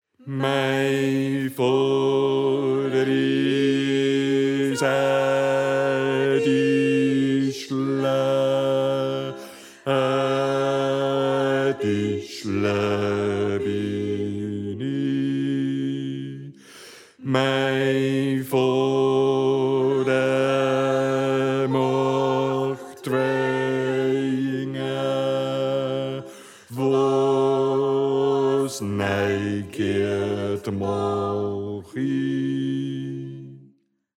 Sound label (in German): Bass